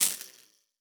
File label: Spark, Electric; Sci-Fi Sounds